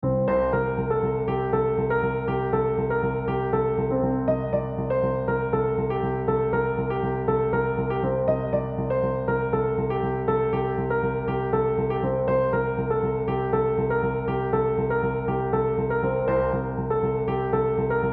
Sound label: solo, piano; Roja